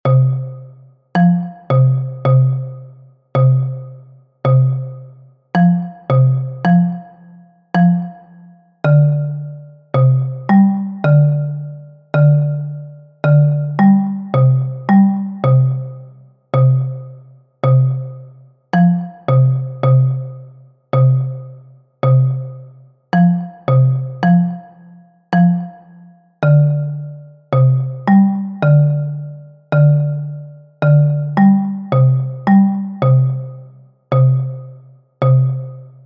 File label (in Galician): RUMBA Xilofono Baixo